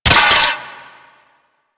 Hand Rocket Launcher Mech C; Crp Hand Rocket Launcher

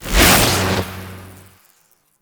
Cloak Pulse; Powers; Warframe; Focus; Operators

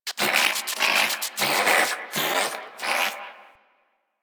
Landscape Close Critter, Ambience; One Offs; Warframe; Venus Open World

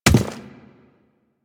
Chimera Step Default

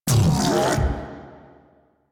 hand bomber pain; Warframe

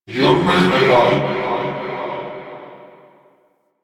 Rally Cast Vocal; Warframe; Warframes; Hoplite

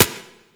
Turret Low Clip; Weapons; APC; Warframe; Lasrian

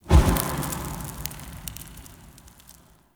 Soft Flame Burst; Ambience; One Offs; Champion Crypt

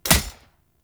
Throwing Knives Impact Armour; Weapon Impacts; Player; Combat

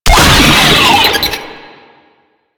Infested Player Spotted; Enemies; Vocals; Warframe; Proto Infested; Brachiolyst

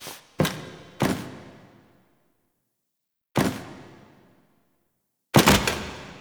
Steps; Teshin; Warframe; The War Within

